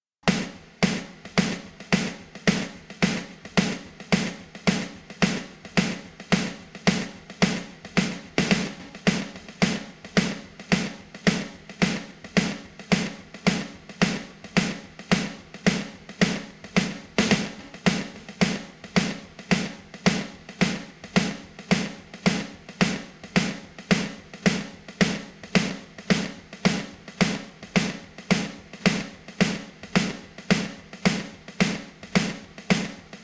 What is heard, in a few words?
drums